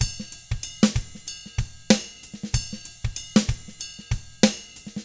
FUNK, Drums; ILIO; Double Platinum Drums 1

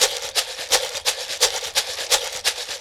TAP, African; Spectrasonics; Supreme Beats

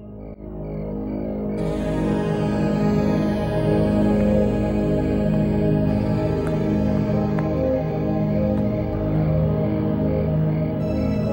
FX multipad, Pads; Sonic Foundry (Sony Creative Software); Ugly Remnants VOL-2

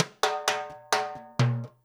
FILL, DANZON, Percussion; InVision Interactive; Percussion Slam

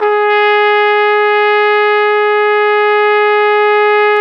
Flugelhorn; Roland L-CDX-03 Disk 2

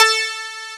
digi decay; AKAI S6000 CD-ROM - Volume 1; SYNTHESIZER

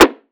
snare

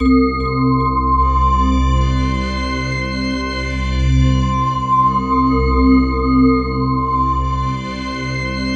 WEIRD, WEIRD LOOPS; Zero-G Ambient 1

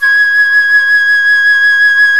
FLUTE, Flt vib; Roland L-CDX-03 Disk 1

